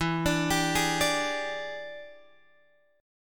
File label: E 7th Sharp 9th Guitar Open G, chord